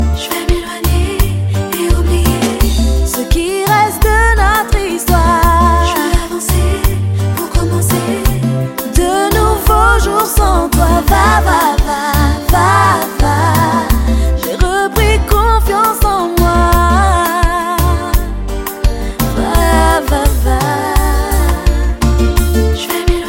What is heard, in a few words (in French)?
Zouk